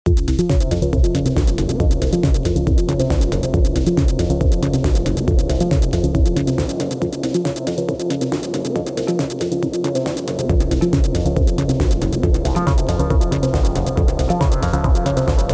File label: Analog Keys oscillator through Surges and back into AK for amp envelope. Surprisingly beefy low end.; Edit: (that’s the Analog Keys oscillator through Surges and back into AK for amp envelope. Surprisingly beefy low end.)